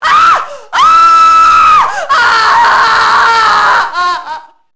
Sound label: female fire death; enemy; Max Payne